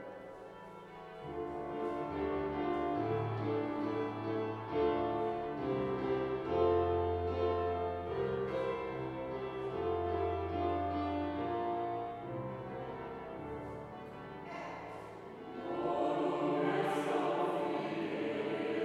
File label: Taize